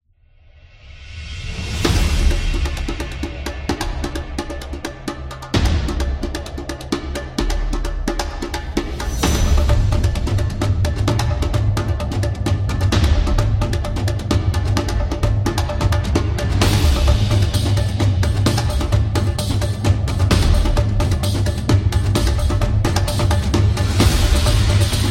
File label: Tribal Drum; battle drum